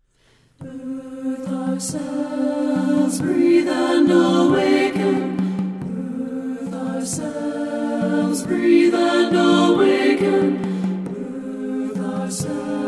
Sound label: pawnee prayer, a cappella